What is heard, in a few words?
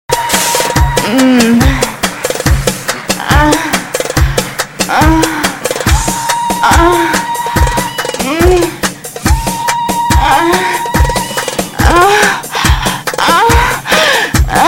orgasssmm